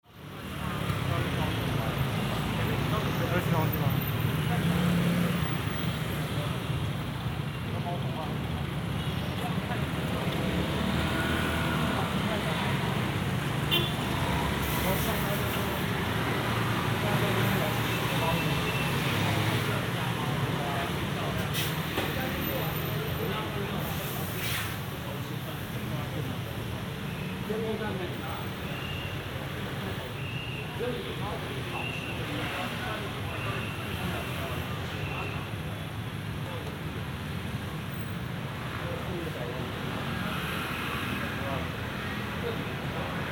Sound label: taipei near hotel